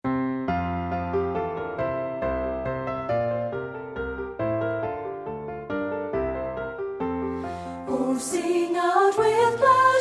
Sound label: VOCAL